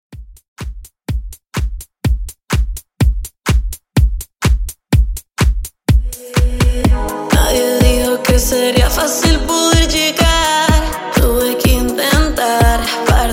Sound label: Intro 125Bpm